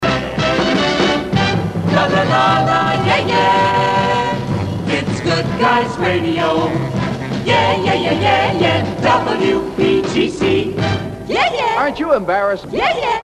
jingle yeah yeah embarrased

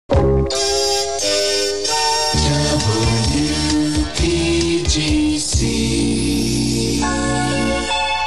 jingles slow cut; 25th tm package

